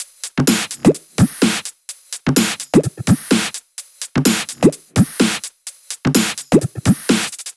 127BPM Kit; Vengeance Samples; Warhog Kit

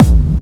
Bassdrums Clubby Kicks; Vengeance Samples

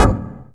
Percussion; Vengeance Samples; VEE Percussion Instruments

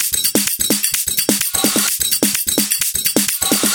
Latin House Loop 128 BPM; Vengeance Samples

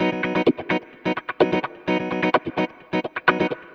Electric Guitar Kit B maj, 128BPM; Vengeance Samples; Special Sounds

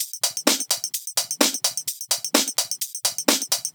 Minimal Loops

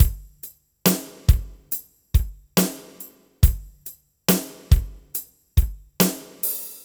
Slomo Beat 140 BPM; Vengeance Packs; vengeance essential club sounds-3; VEC3 Loops 140 BPM; VEC3 Slow Motion Beats 140 BPM

